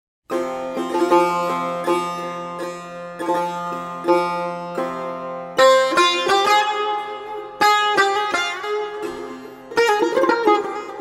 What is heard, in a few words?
Improvisation in Bayat-E Shiraz